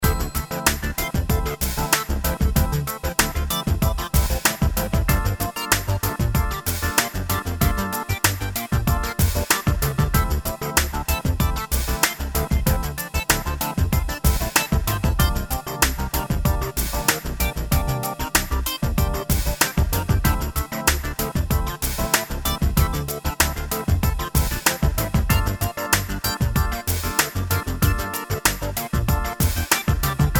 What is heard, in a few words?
Digital Chatter